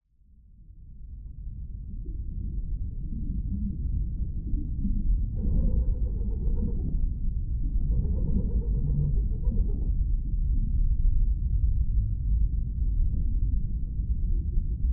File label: Super Low Rumbles, Apocalypse, Underwater, Groans; 344 Audio; Haunting Ambiences Vol. 2